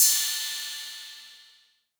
cymbal clash, Hip-Hop; Drum One Shots; SD CAICOS Hip-Hop Sample Pack; Slate

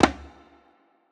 percussion oneshot; Pop; Drums; SD PASADENA Pop Sample Pack; Slate

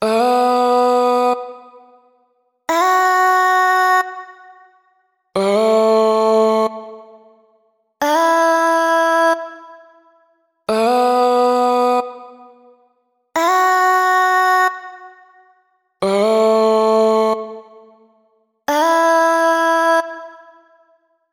Simple Chant vocal chop, 90, Bm; Slate Digital Omnivox Vocal Sample Pack; Chops

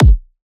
kick tron; Drum Sample Pack; One Shots; Slate; SEISMIC

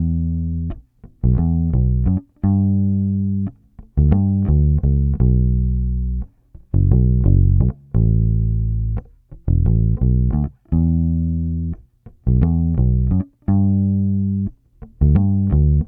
Bass loop 87 Cm, Electric Bass; Electric Bass Loops; Slate Digital BASSHOLE Sample Pack

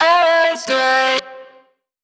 phrase C, Vocal; Slate Digital Omnivox Vocal Sample Pack

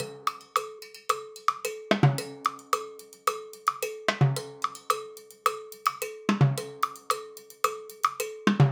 timbales loop 110

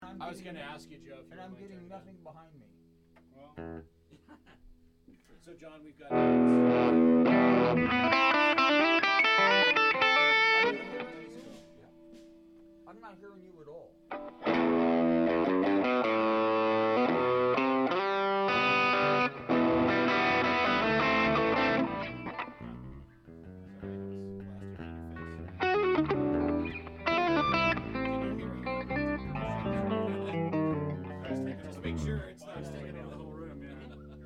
Strings Only

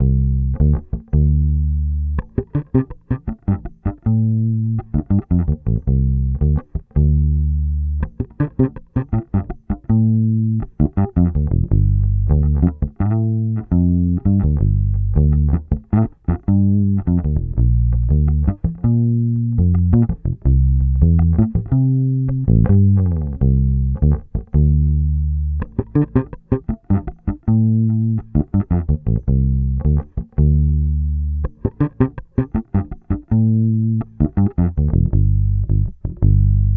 Bass loop 82 Dm, Electric Bass; Electric Bass Loops; Slate Digital BASSHOLE Sample Pack

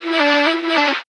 vocal backwards Em; Slate; AURORA Sample Pack; Vocals

